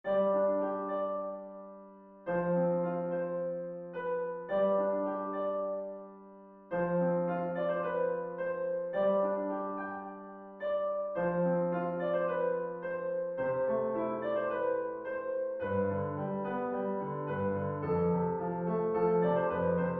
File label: Nature Musings, midi sound